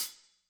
hihat; pasific; Pop

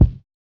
Hiphop kick; Reverb+EQ+Comp+Limit

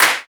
Electro clap; Reference Beat

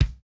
kick, house

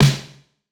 pop snare

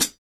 Electro hihat; Reference Beat

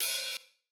open hihat; Pop; Reference Beat BPM-130; Pophits

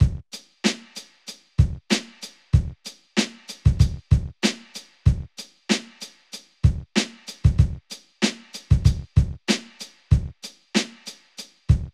lofi hiphop loop 95; Legendary lofi hiphop

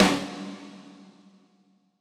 hall resonance snare

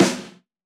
snare samples, Rock

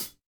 hihat, house

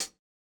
Modern hihat, Electro

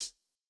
Jazz hop hihat

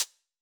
90s hiphop hihat; Reference beat bpm90

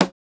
snare, snare house

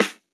hiphop snare, Effect on

